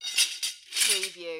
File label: Drag Tools